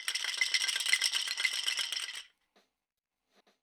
DUB PERC; Drumdrops In Dub VOL-1; SINGLE HITS; DUB FX

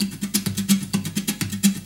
Loop, Roland; Sound & Vision - Gigapack I

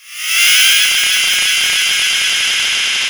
FX AMBIENT R; Big Beat Samples & More; AKAI; OTHERFX KIT

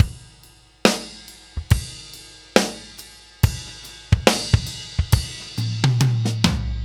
Ballad Loop, Drum, 70s Ballad; Drum Tools

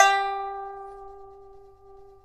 PIPA G3; INIS; Opium

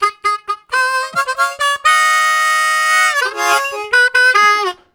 Tongued, Harmonica Riffs; Zero G - Harmonica

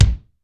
DRY K, drum; Crypton; DrumBase5000

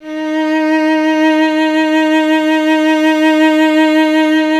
STR VC. D#, SOLO SECTION; Roland - LA Composer vol. 1

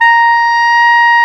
clarinet, Clarinets

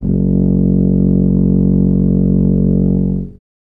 tuba F#0; Best Service ProSamples vol.51; Classic Orchestra 2

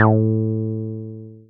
Synths BASS

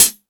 High Hat, E-MU Pro-Cussion

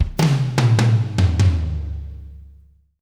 Fills 75; Zero-G; Monster Beats

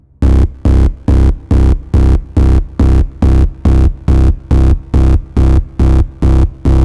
Trance Bassloop 140bpm, Bass Arps

